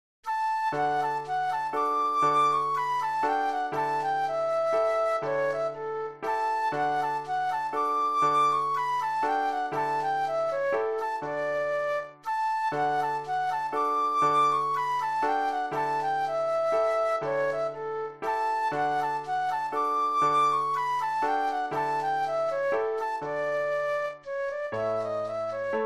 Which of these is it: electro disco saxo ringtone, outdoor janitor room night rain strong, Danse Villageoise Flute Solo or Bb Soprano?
Danse Villageoise Flute Solo